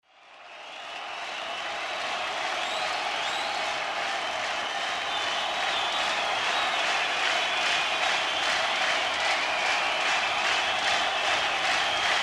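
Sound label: Rock Concert Large Crowd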